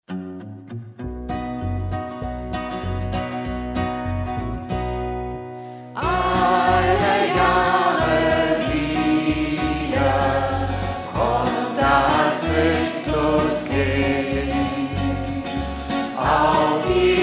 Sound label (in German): Alle Jahre wieder, kinderlieder; canto kinderlieder CD 1